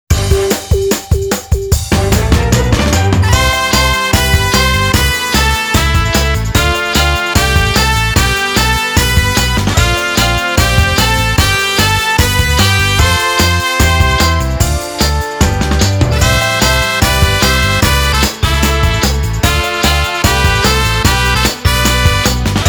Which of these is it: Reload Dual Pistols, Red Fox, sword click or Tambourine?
Tambourine